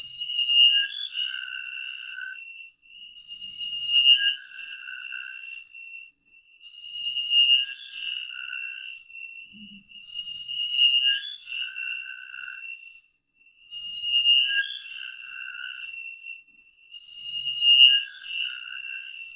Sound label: organic alien portal loop